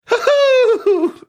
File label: "hoo hoo"